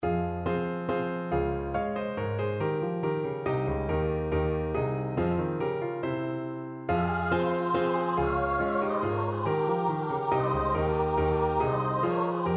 vocal duet; shepherd

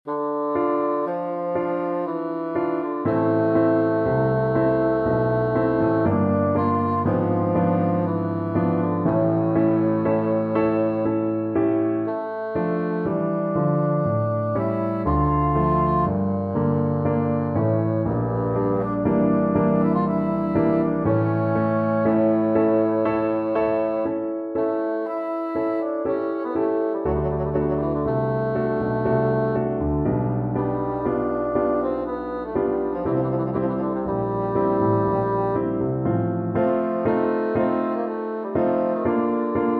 galliard sonata, bassoon

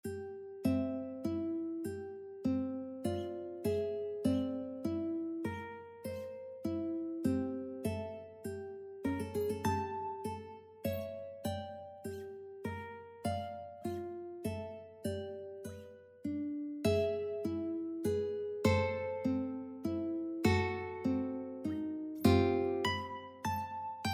ukulele

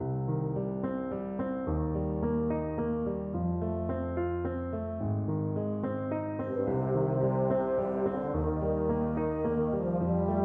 house of the rising sun EUPH

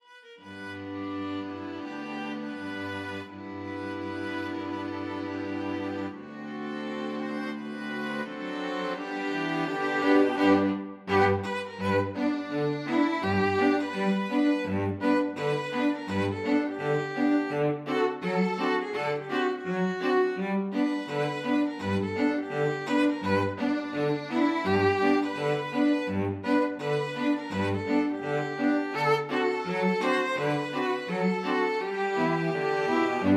down by the riverside, string quartet

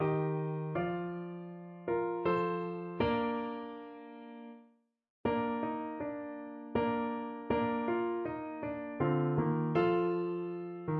Clarinet

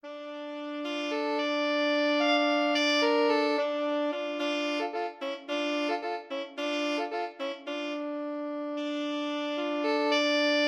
alto sax tenor sax duet; dvorak from the new world

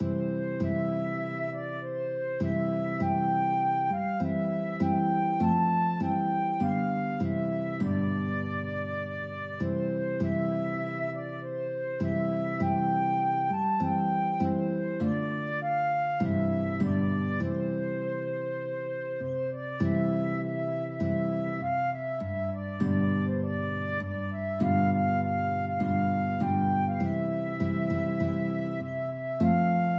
guitar flute duet

